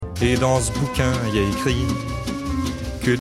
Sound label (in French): Quinte Montante